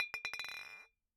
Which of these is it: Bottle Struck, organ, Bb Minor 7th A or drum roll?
Bottle Struck